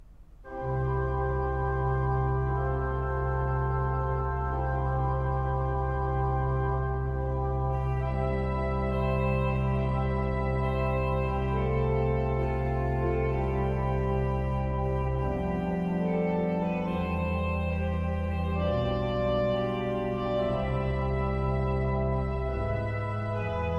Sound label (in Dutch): orgelklanken; Bekende orgelklanken